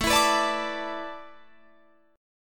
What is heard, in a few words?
A Suspended 2nd Suspended 4th down Guitar Drop D